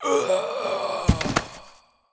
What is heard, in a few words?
die